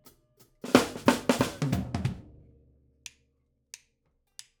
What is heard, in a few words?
OHs